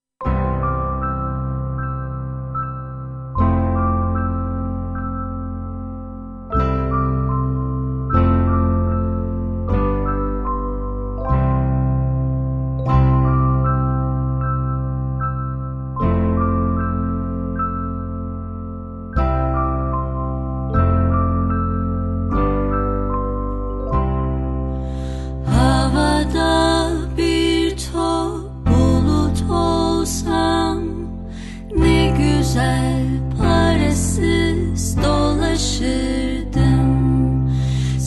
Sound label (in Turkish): Çocuk Şarkısı, Türkçe Okul Ve Çocuk Şarkısı